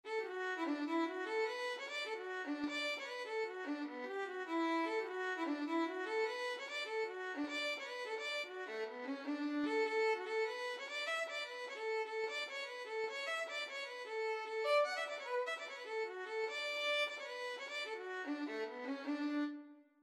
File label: violin